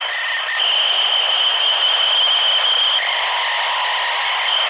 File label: radio jamming